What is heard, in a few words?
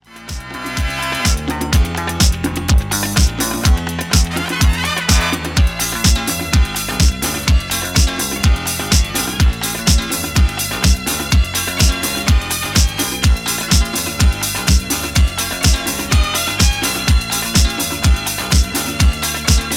instrumental